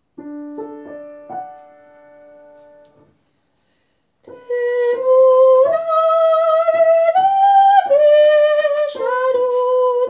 Temuna soprano

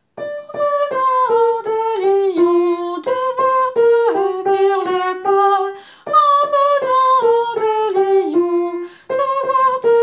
lyon soprano; En venant de Lyon

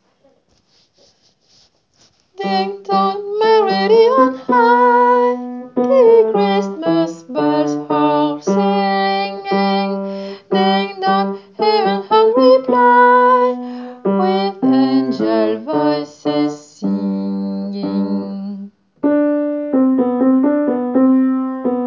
bass; ding dong merrily on high

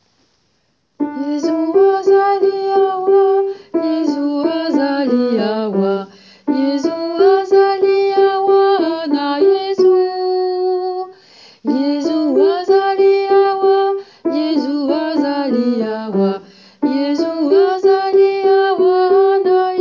alto

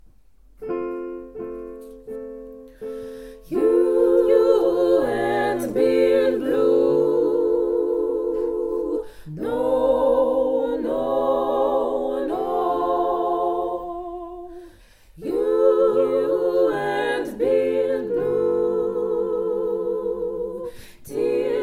tutti

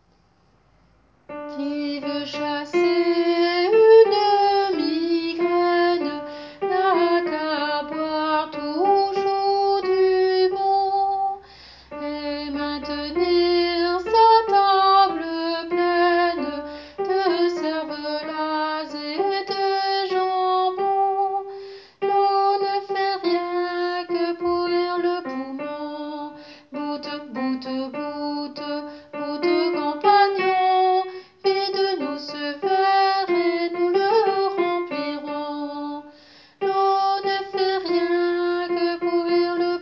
migraine alto; Qui veut chasser une migraine

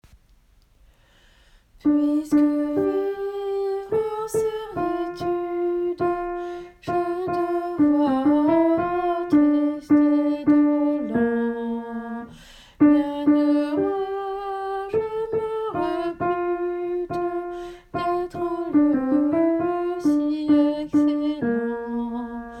Servitude Alto; Puisque vivre en servitude; Pierre Sandrin – 1548 26 ème livre Attaingnant

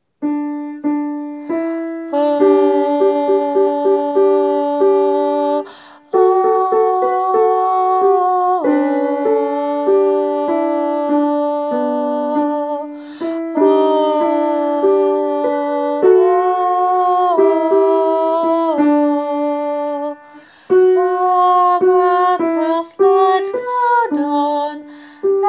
down alto; Down in the River to pray

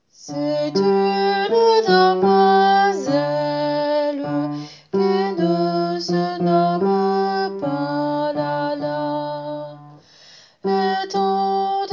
bass; C'est une damoiselle

